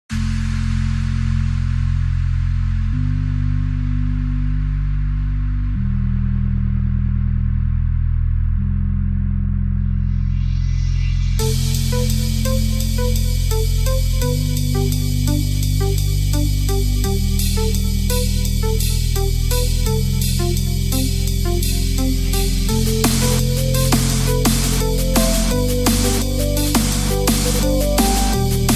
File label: Techno Dream DnB; Geometry Dash